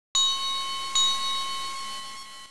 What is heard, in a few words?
Shipbell; sound effect; Bellen